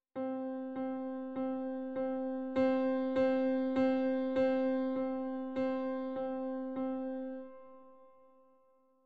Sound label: Test f